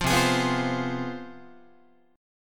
C Major 7th Suspended 4th Sharp 5th, down, Guitar; CM7sus4#5 strummed; JGuitar

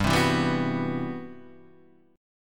C Major Sharp 11th G

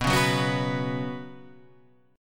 B Suspended 2nd, chord; strummed; JGuitar

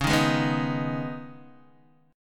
Db 7th Suspended 2nd Db, down, Guitar Open D

